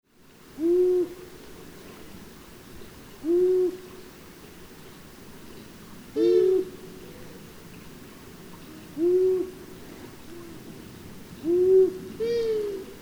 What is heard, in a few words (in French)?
Hibou moyen duc